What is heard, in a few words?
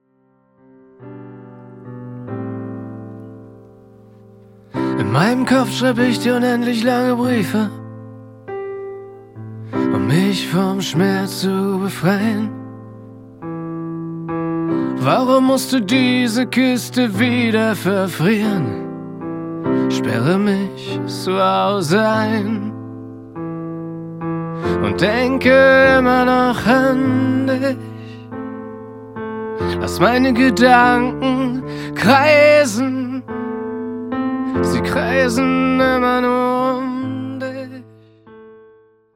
piano